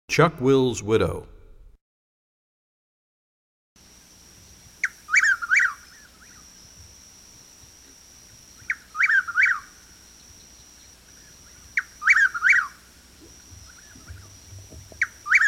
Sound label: Chuck Will's Widow, Bird Songs; Animals